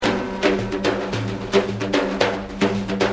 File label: daf; Saz; Sonati